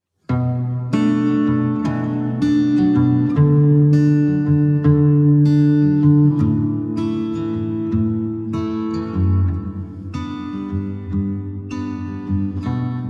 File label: in the forest slowed and reverbed; folk